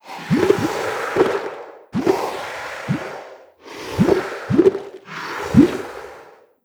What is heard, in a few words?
space whale death; Stellaris; other entities